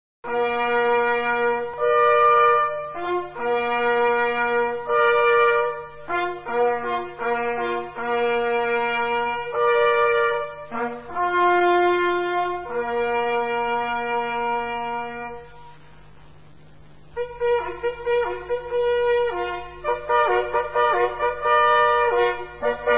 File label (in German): Jagd vorbei Halali